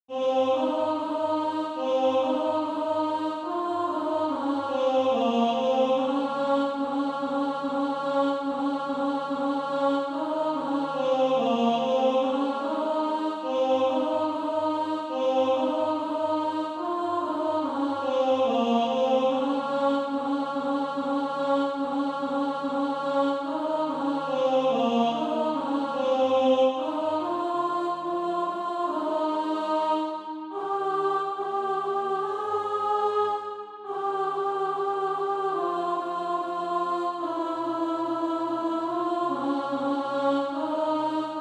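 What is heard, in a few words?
alt, Practise Music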